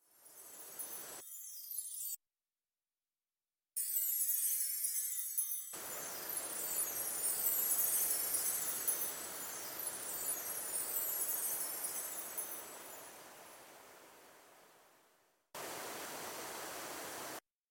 geraeusche engel erscheint maria

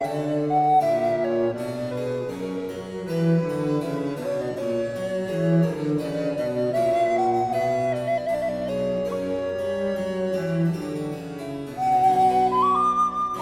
sonatina c moll fuer altblockfloete und basso continuo largo

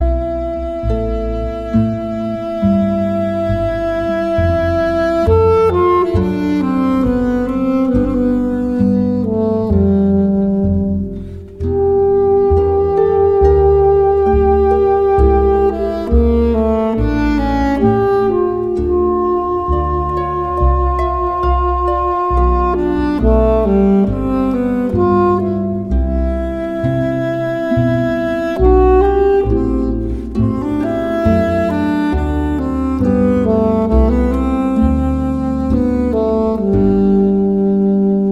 air altsaxophon

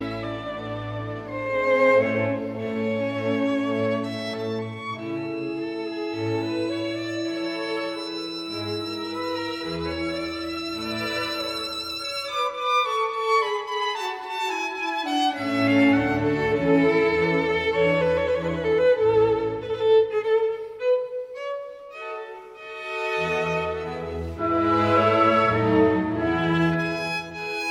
violinkonzert nr 4 d dur kv 218 andante cantabile

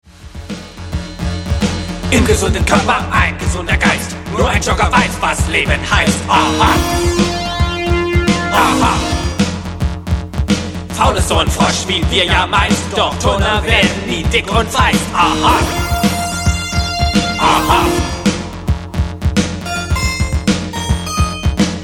lied turner blues und breakdance

